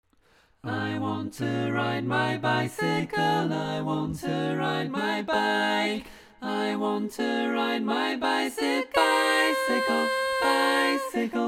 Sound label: tenor